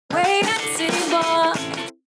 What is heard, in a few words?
Vocal Sample Gtr EQ In